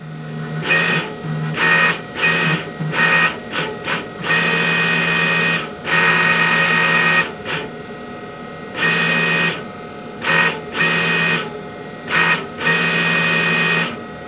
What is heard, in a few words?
computer tape; ambient; Team Fortress 2